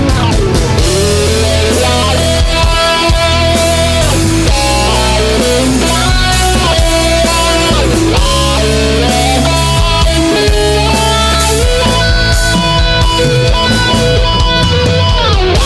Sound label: Guitar